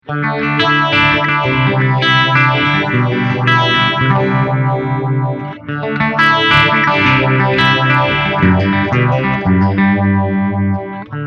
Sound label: Phase Clean Vintage